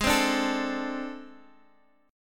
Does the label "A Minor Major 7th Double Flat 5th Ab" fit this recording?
Yes